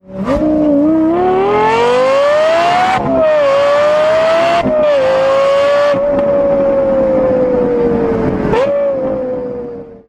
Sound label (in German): lexus lfa, laute sportwagen sounds; lexus lfa klingelton